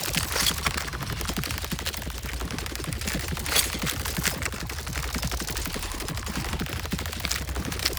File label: Wall Climb Movement Foley Loop; Warframe; Oraxia